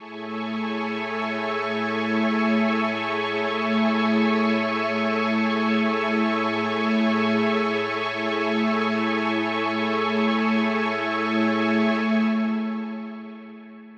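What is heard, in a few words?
Ambi String A major, synth orchestra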